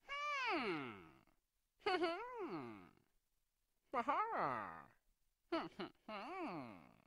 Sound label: Ammm